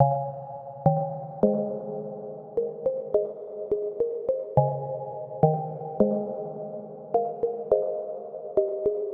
G#maj 105BPM Keys; 99Sounds Music Loops; PANDORA BOX!; Yacht Week; Monosounds

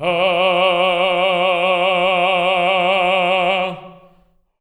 TENOR F#3, Voices; Spectrasonics; Symphony of Voices

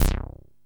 TEISCO, BASSES

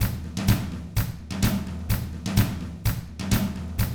127 Drums

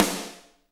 SD Real, Roland; Sound & Vision - Gigapack I

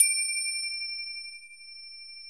PRC, Finger Cyms; Roland LCDP03 Orchestral Perc

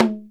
logdrum, percussion; ILIO; Synclavier Percussion 2; World & Orchestral

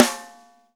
SNARE, Ensoniq ZR-76

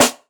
Snare, jungle breaks drum sounds

